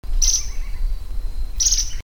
Philydor lichtensteini call

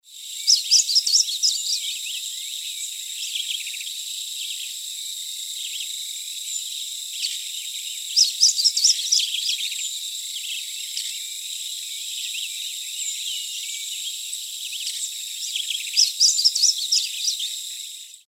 Amaurospiza moesta

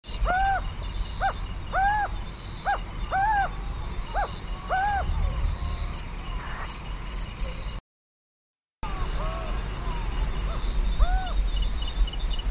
Athene cunicularia

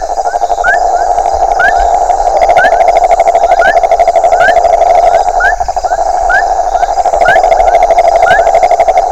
Rhinella schneideri; loma plata

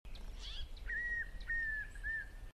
Nystalus maculatus

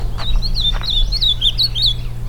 Cyanocompsa brissoni

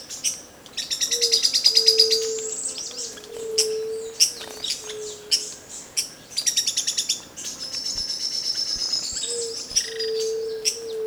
Philydor rufum

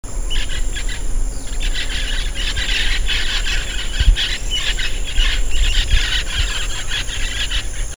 Pionus maximiliani flight

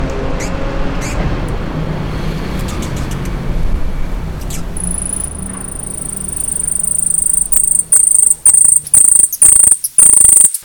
Hylocharis chrysura territorial

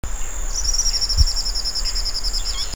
Tyrannus melancholicus

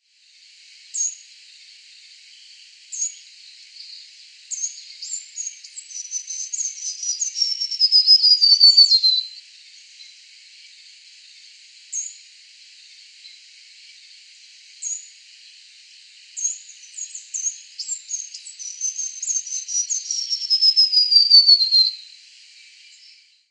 Basileuterus leucoblepharus song; Quinto Potrero

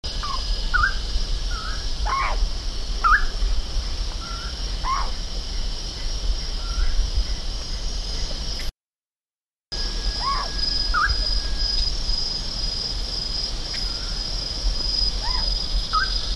Lurocalis semitorquatus

